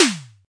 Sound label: Visco Space Drum; drum machines